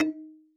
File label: marimba kalimba